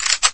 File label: boltpull